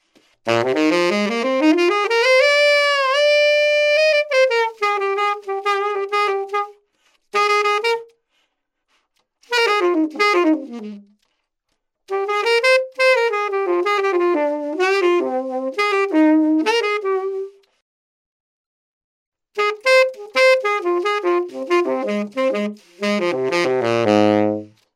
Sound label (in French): extrait sax